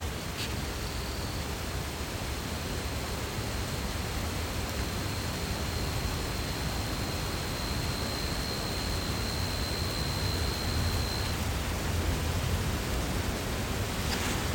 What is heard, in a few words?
P lineolatus